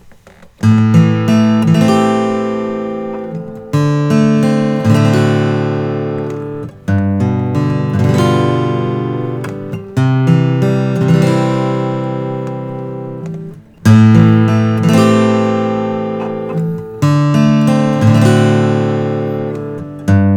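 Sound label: taylor koa 12 fret grand concert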